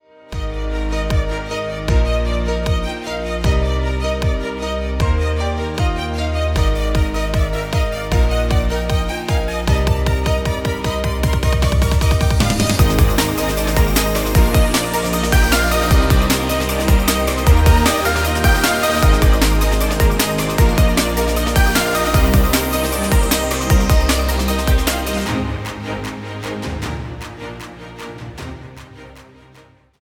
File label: instrumental